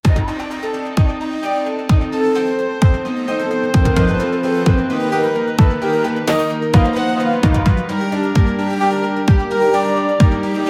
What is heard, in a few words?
cool anime music